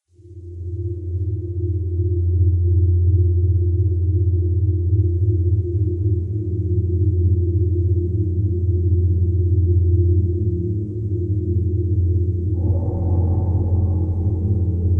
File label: ambience; cstrike; jb engine; days mode; ghosts